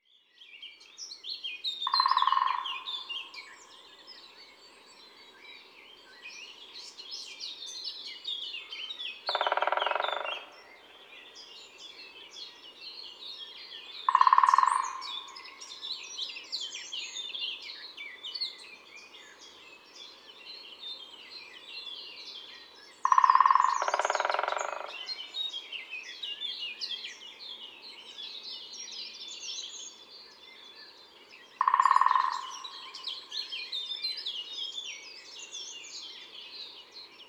Pic epeiche, ABC des chants d'oiseaux

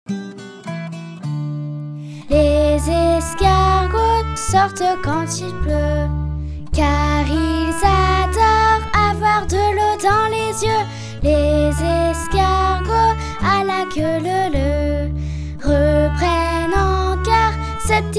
Les escargots SONG

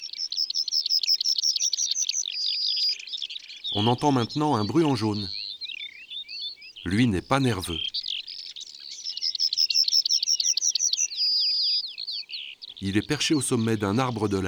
Bruant jaune ABC des chants d'oiseaux

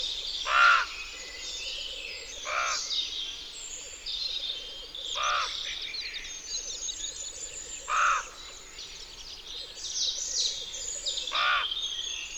Cassenoix mouchete, Concerts naturels de l'Isere; Studio Les Trois Becs

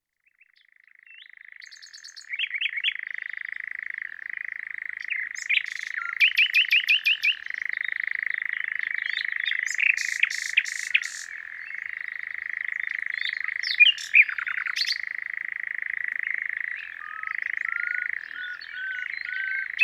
Rossignol Philomele Engoulevent d'Europe; Ardeche; Concerts Naturels De l'Ardeche

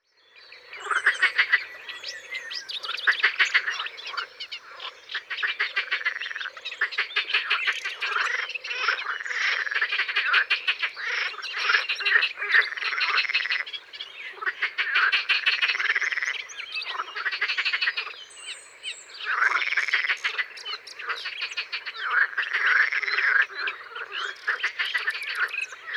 Grenouille verte; abc de la nature

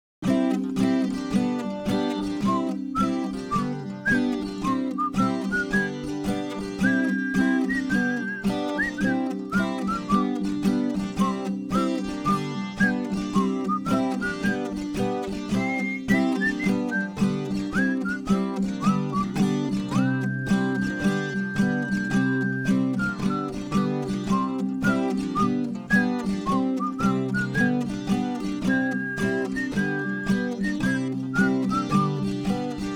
Les noisettes version instrumentale

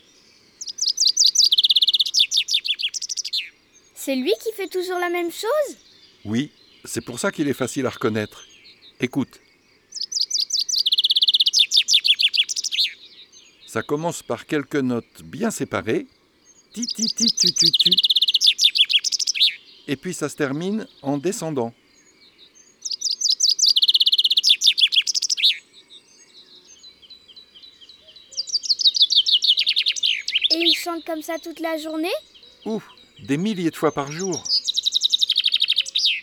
le pinson des arbres; cuicui et compagnie

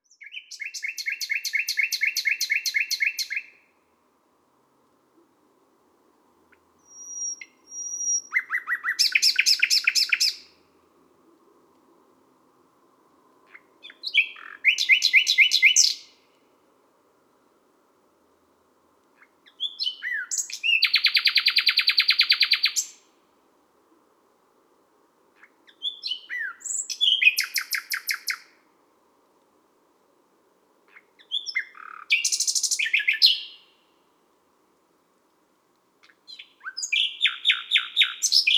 Rossignol philomele a minuit Les Salelles Ambiances Sonores d Ardeche; Studio Les Trois Becs

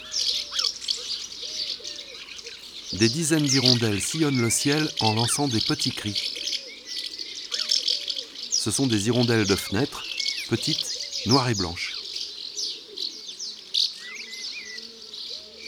Hirondelle de fenetre ABC des chants d'oiseaux

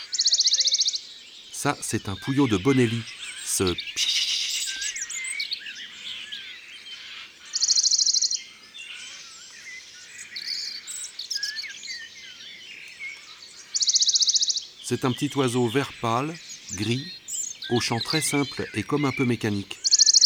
Pouillot de Bonelli; ABC des chants d oiseaux

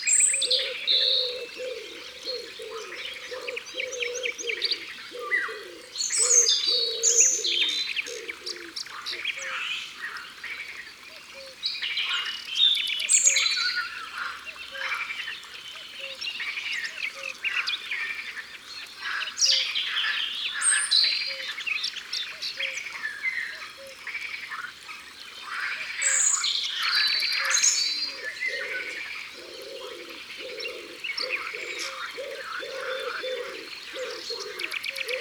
Pigeon ramier Au bord de l'eau

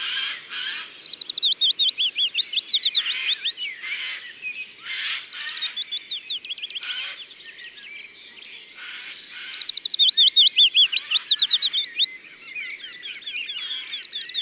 Pouillot fitis; ABC de la nature

